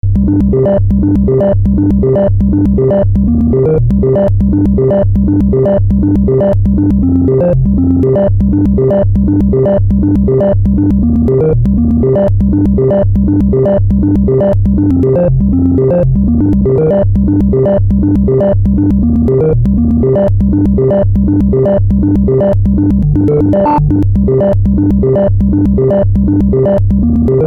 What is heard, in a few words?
CMI V Additive Organ Sequence; Arturia